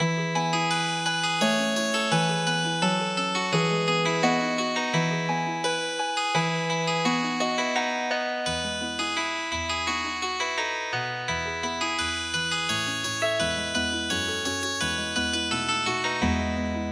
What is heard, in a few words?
Healing Harp, traditional